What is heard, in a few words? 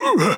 damage; MonsterHunter; Fight